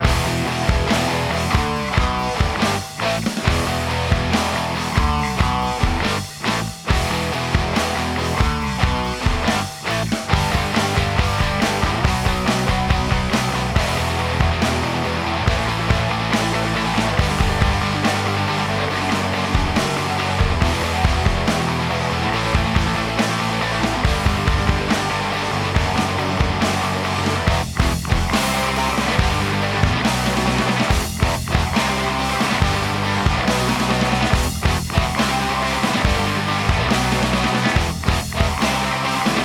guitars untimed

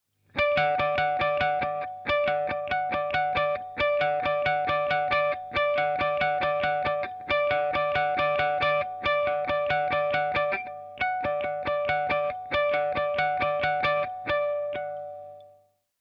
original plinky guitar

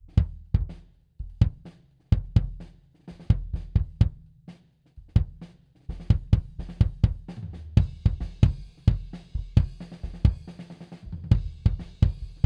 kick distance blanket D112

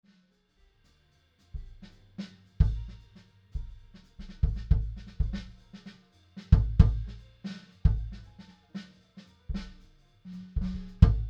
kick unprocessed; d112

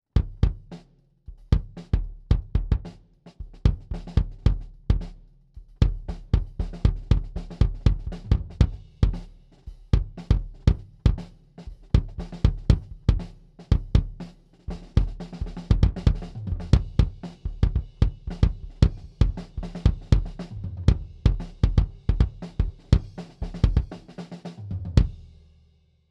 kick head on mid; D112

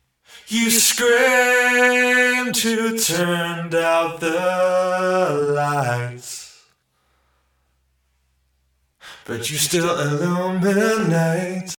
vocal processed

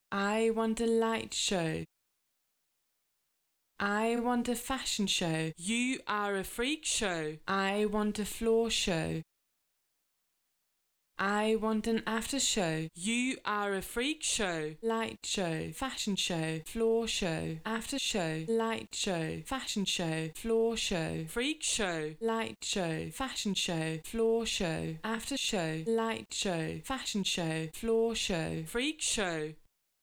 vocals dry original

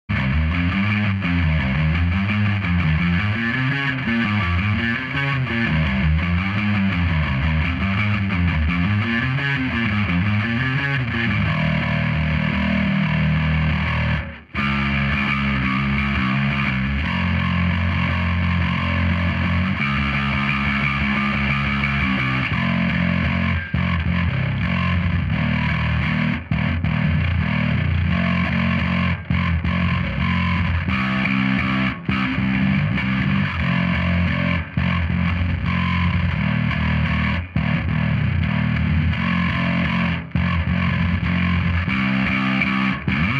bass small cab processed

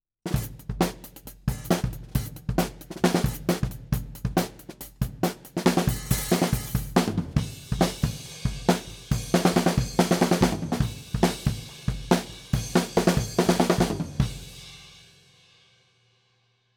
snare top; C414; shell

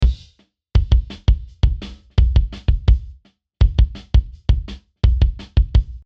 kick after

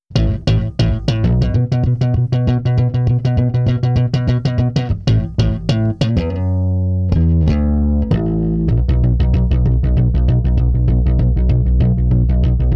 bass gtr aboriginal; bass gtr c

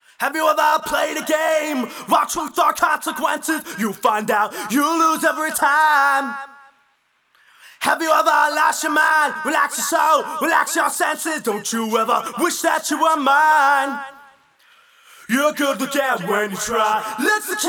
processed lead vocals with fx

